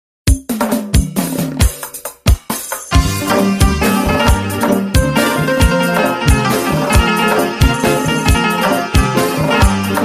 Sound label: Keyboard